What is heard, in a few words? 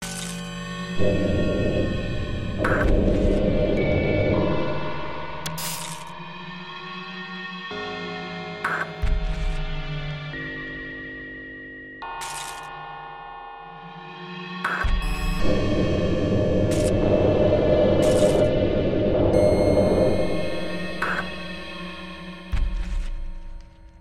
serious arrange